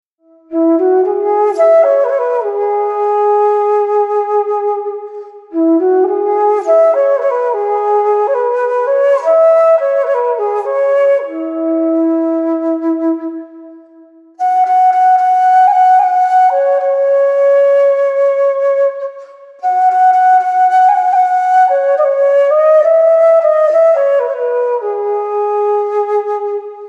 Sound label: wood flute F